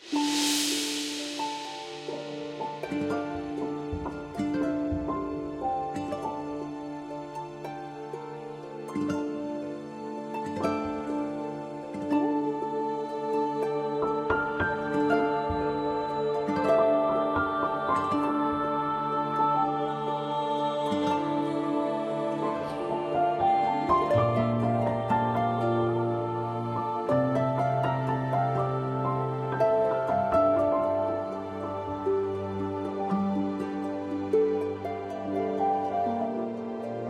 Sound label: Timeline BGM; AFK Journey; Android